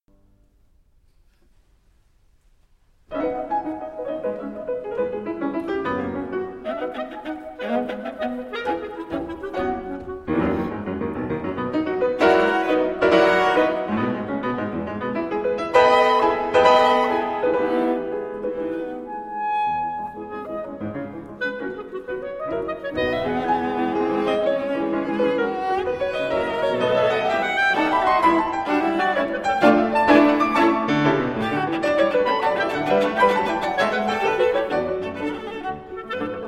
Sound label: Pieces for Clarinet, Viola and Piano, III. Allegro Vivace Ma Non Troppo